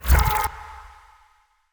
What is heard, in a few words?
Ice Spell Utility Levitation; Utility Spells; Arctic Aura